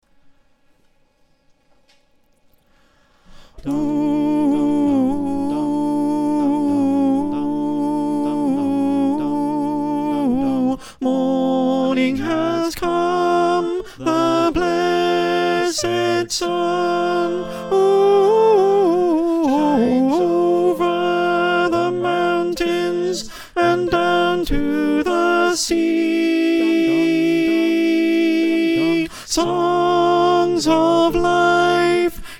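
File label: alto, choir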